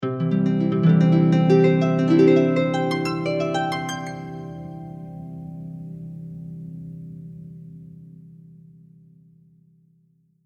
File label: Magical Harp, Dreamy story-time harp transition.; Miscellaneous Musical (Sound Effects:Musical)